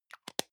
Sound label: deo can lid close medium, badezimmer; haus-garten